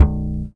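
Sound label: bass guitar A2, jazz bass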